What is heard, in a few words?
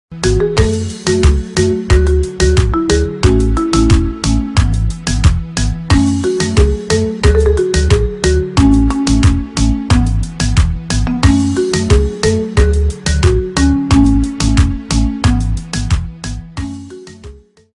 marimba remix ringtone instrumental ringtones